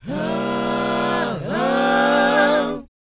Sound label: BOYZ F#, Vocal Planet R&B & Dance; ILIO